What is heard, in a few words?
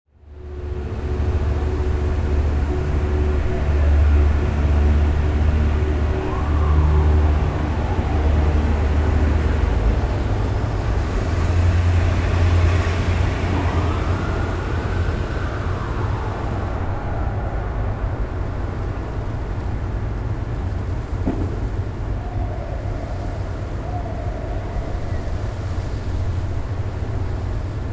SFO General Soundscape